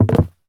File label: wobbly woodland; Golden Crayon Sounds